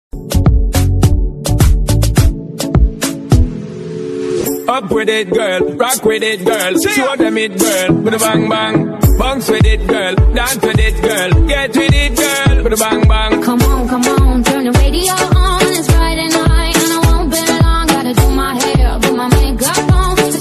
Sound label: Mobile Ringtone